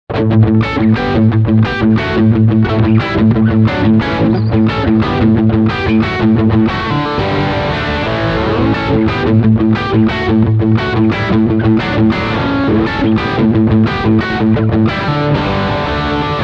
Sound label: Rhythm rear humbucker